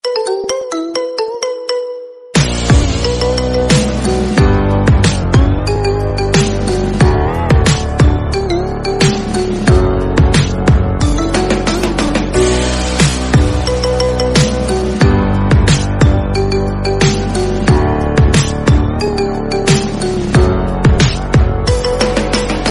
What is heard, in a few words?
Marimba Remix Ringtone